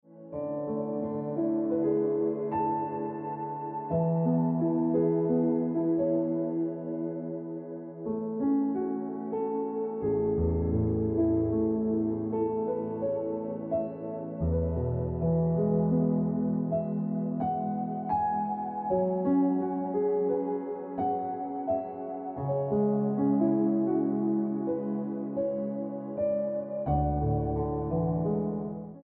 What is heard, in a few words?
Piano Music